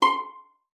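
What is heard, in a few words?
violin pizzicato C6; Xsample VOL-5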